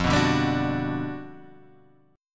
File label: G Major 7th, F; strummed; JGuitar